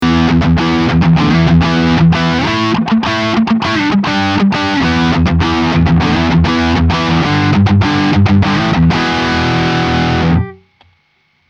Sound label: Harley Benton TE90FLT rock